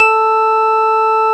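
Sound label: organ A4; Best Service; Advanced Media Trax Modern Composer; DANCE ORG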